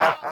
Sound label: evil urban stinger laugh